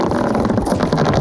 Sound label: stress, antlion grub; hl2